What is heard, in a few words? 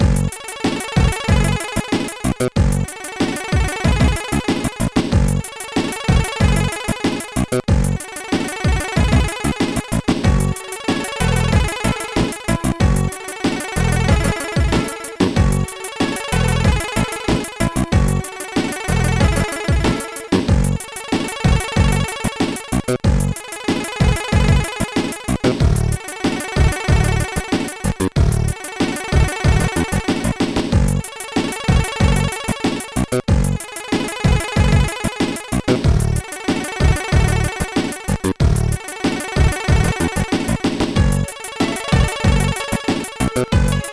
short loading tune, chiptune